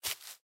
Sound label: Foot Step